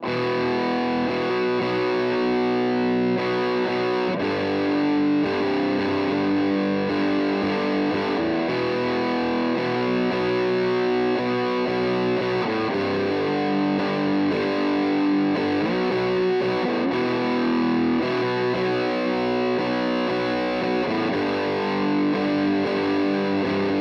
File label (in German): MH 1000 Zerre; ESP LTD Deluxe